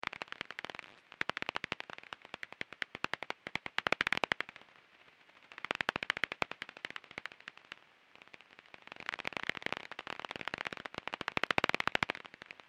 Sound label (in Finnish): vesisiippa; janakkala; laurinmaki